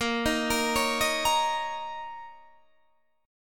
A sharp Minor Double Flat 5th, A sharp, down, Guitar, DADGAD